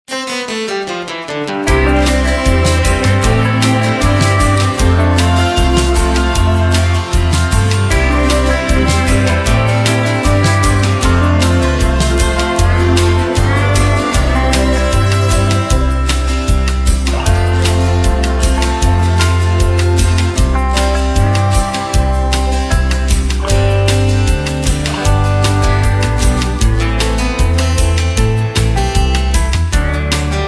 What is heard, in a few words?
(C) No Backing Vocals